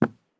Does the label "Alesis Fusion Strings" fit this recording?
No